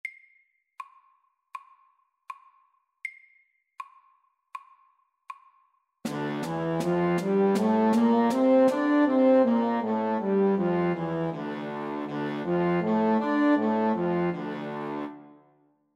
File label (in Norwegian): D moll natura MIDDELS